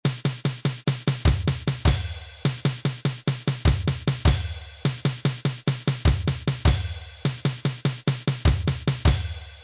Toque; fanfarra

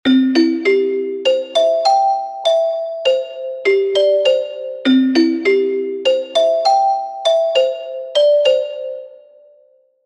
Toque - Lira; fanfarra

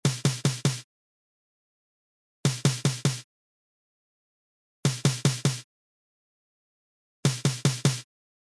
Toque - Caixa; fanfarra

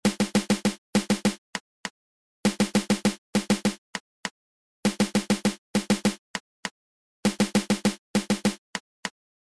Toque - Caixa; fanfarra

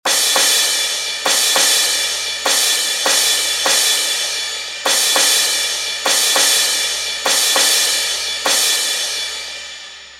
Toque - Prato; fanfarra

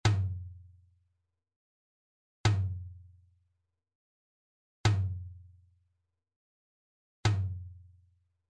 Toque - Surdo Medio; fanfarra

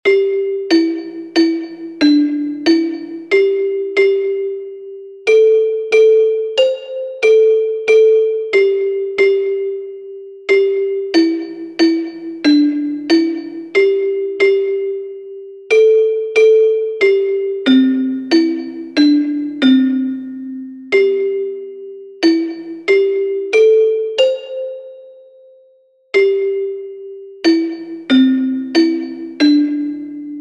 Toque - Lira; fanfarra

